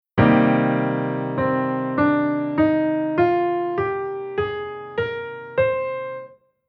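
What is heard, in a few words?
Chord Scale Minor Natural Minor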